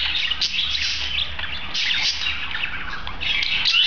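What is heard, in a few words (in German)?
wohlfuehlgesang maennchen, wellensittich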